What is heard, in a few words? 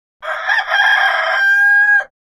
Rooster Crow Typical